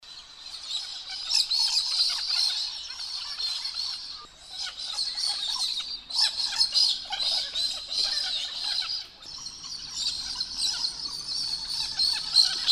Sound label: Galah